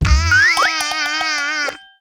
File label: China Hit Sound; Jolly Battle